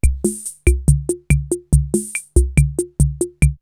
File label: CR78 R77 BeatBox, drumloops, DrumMachines; X-Static Goldmine